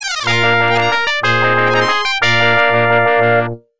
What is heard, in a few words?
Game Paused; Star Trek The Next Generation Echoes from the Past; Genesis